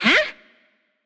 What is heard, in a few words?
nv confused; cupid